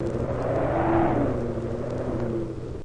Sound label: spookywind; Zoo and Nature